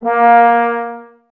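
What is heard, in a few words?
brass trombones A#, Woodwind Brass Ensemble; 90 s Sample CDs; Miroslav Vitous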